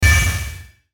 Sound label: Hit Static Chime, Distortion Static Burst Glitch; Distortion Static Burst Glitch Production Element Imaging Accent Transition; Blastwave